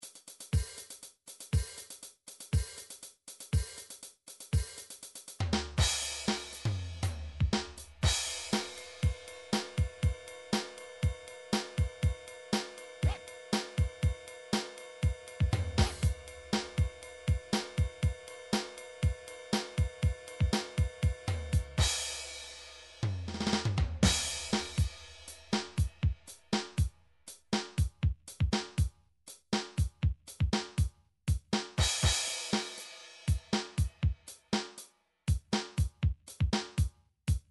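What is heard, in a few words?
midi drums, classic rock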